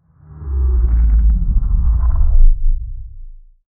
massive sci fi spaceship

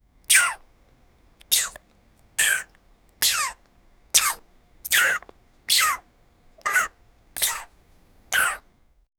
french kiss, romance

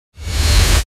starting short whoosh tra

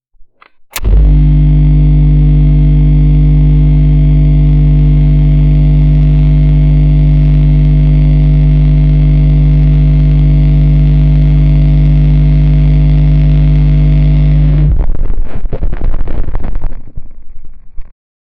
heavy gen startup electric

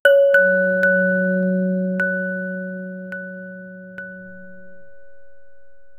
low tone vibe 3 chimes

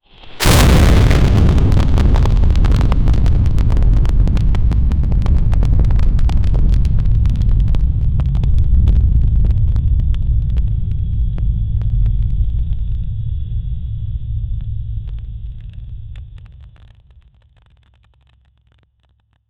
ethereal supernatural

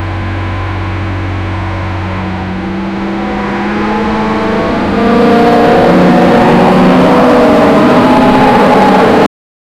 airbus a380 off take

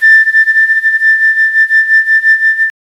the sound of an oriental, oriental

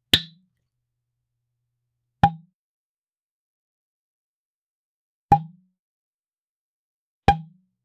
a not sharp clean pop, drinks

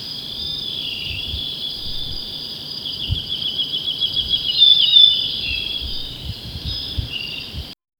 soft moans of the deer, calls

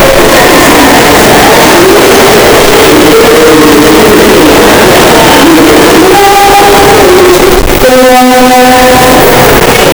train station crowd; announcements

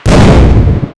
storm

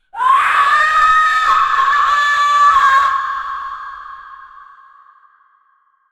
mythical

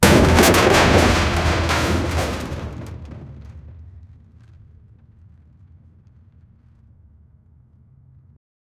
massive boulder crashing

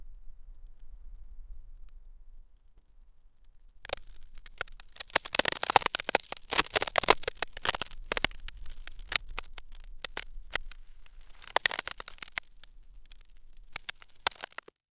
poof of snow followed by, winter

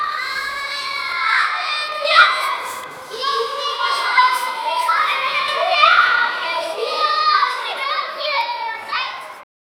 school kids sounds

